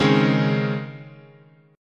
C sharp Major 7th Suspended 2nd, guitar chord

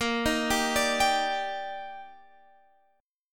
Eb Major 7th Bb

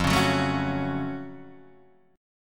Gb Minor Major 7th F

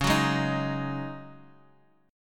C sharp Suspended 2nd Suspended 4th, chord; C#sus2sus4; strummed; 9,9,6,8,x,x

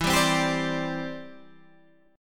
E Minor 7th Sharp 5th E, Guitar Half Step Down

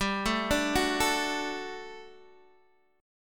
G Major 7th Suspended 2nd G down Guitar Open D

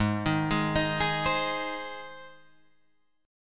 Ab Major Ab down Guitar Drop D

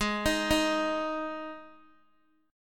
G sharp 5th G sharp, Guitar; arpeggiated; JGuitar

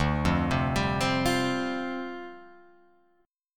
D Minor 11th D down Guitar Open F, Dm11 chord; Dm11 arpeggiated; JGuitar

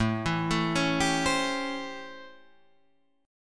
A 7th Sharp 9th, Guitar, Open D

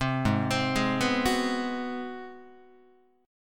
G sharp 7th Sharp 9th, Guitar; G#7#9; arpeggiated; JGuitar

